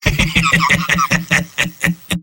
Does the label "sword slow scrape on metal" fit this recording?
No